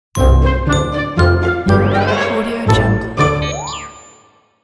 jokes and fails ident

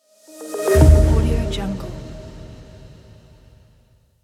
opening transition logo

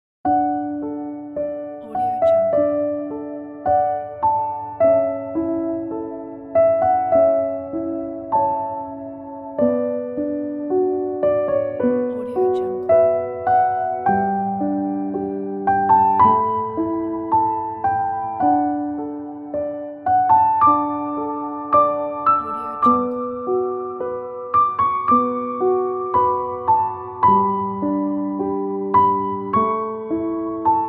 peaceful

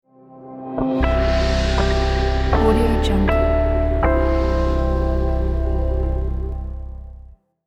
guitar harmonic logo

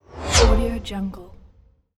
fast whoosh

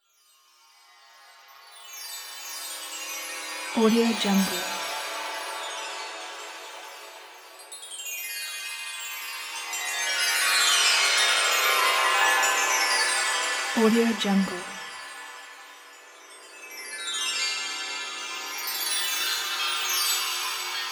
abstract dream ambience

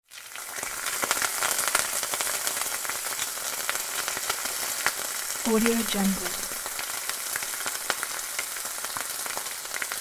frying pan sizzle

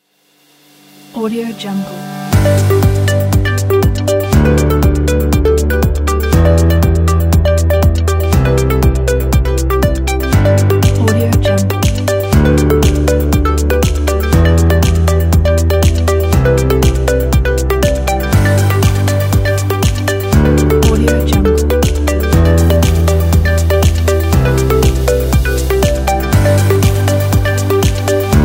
positive day

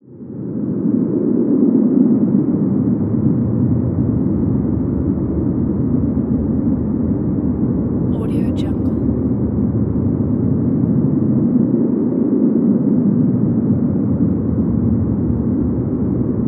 atmosphere noise

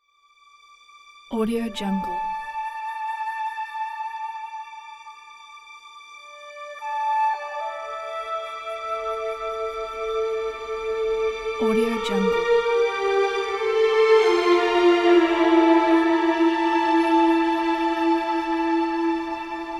a pleasant breeze blows ident

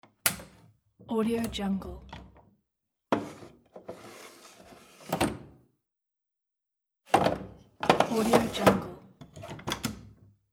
hidden drawer in desk

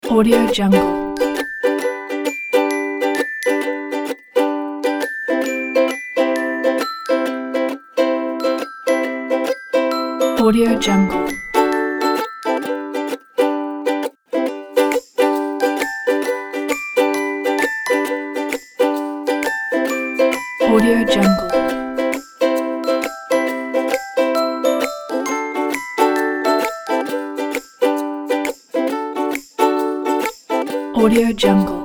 upbeat ukulele folk

